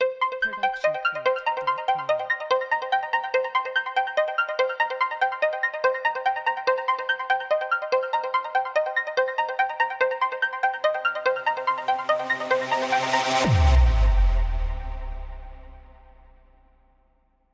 News Ident Electric; SoundsCrate

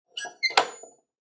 Squeaking; soundscrate

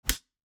Slap; SoundsCrate

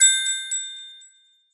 ping

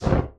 Torch Lighting; SoundsCrate

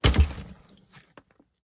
Wall Break

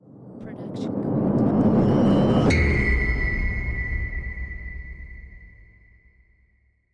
cinematic rise hit; soundscrate

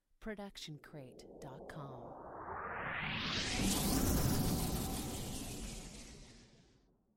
Cinematic Riser

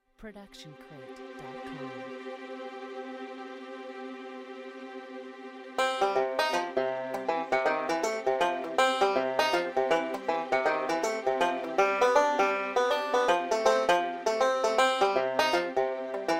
the lonely guitar bpm 80; soundscrate

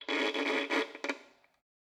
Violin Scratchy Noises

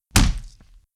fighting kick; soundscrate

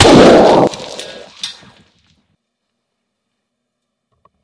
Explosion And Debris

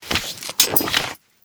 fabric wipes; soundscrate

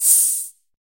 hiss; soundscrate

